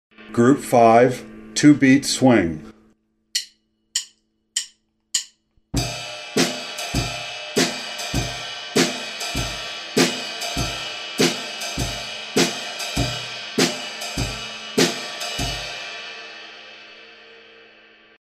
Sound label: two beat swing